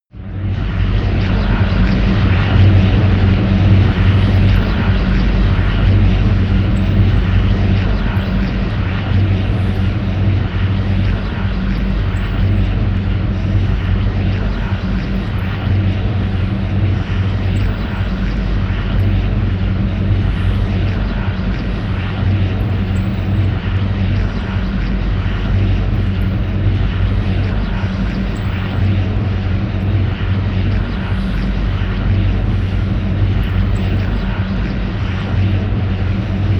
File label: drone storm high